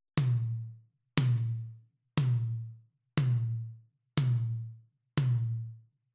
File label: Tom1